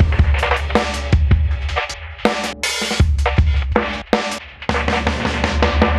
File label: Chopper Beat C; Ударные и барабаны (Эмбиент); Drums; loop; Ambient; 80BPM; ambient vol. 3